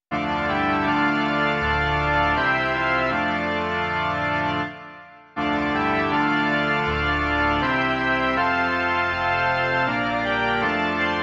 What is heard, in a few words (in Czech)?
varhany